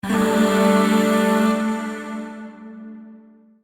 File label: Shawzin Pent Maj Chord J; Warframe; Zariman; Void Shawzin; Chords